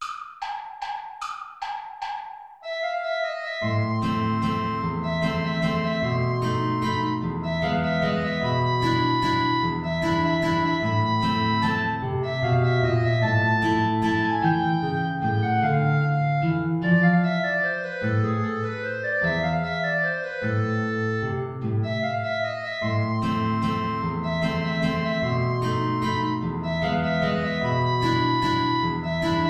play along without flute; traditional

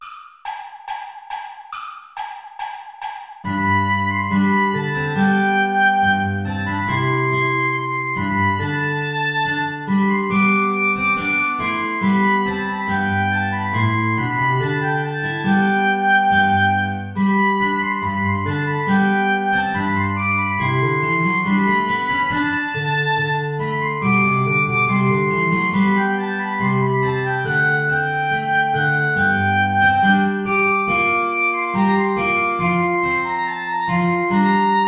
ballet play along without guitar; praetorius michael

